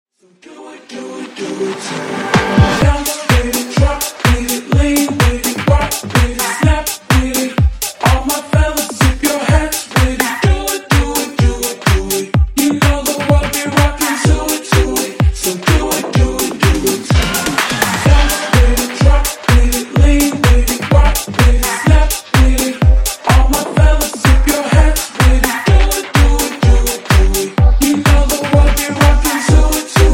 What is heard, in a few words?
Acap In Out Remix Clean 126 Bpm